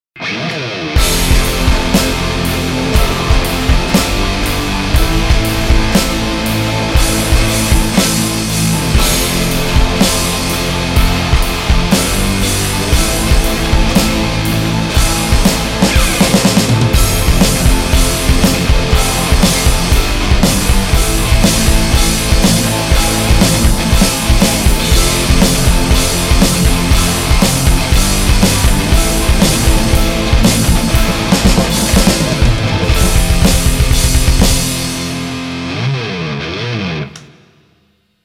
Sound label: Suspense